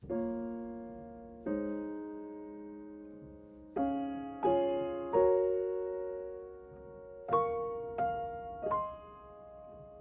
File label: Acoustic; ENGLISH